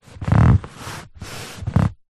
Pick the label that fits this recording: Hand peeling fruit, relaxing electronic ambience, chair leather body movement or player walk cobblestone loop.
chair leather body movement